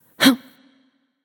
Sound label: Grunt Jump; smite; nuwa